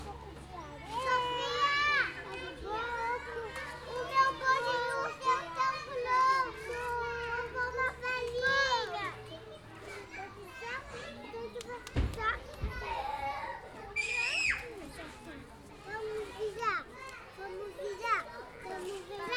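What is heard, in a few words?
Kids Playing Crianças Brincando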